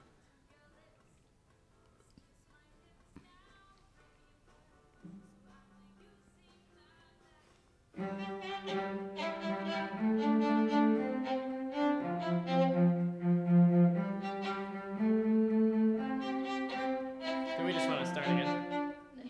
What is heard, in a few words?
violin